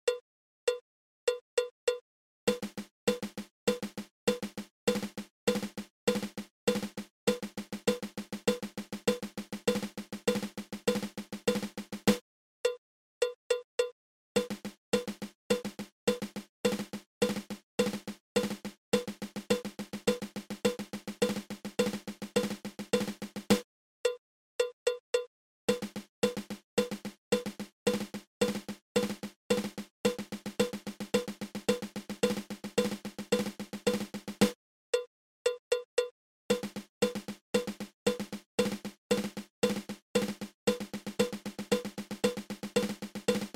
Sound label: Single Dragadiddle